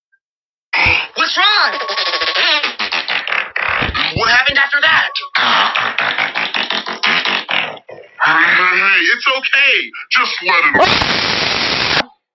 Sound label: fart scream